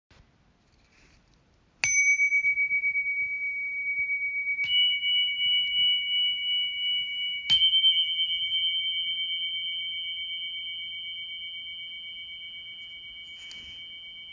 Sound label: chime recording